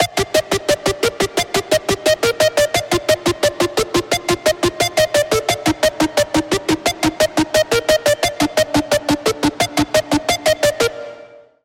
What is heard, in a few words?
Lead; Routed Sound; Spire Expansion - Flame Summoner Vol.1